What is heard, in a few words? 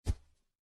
Foot Step; Welcome to the Game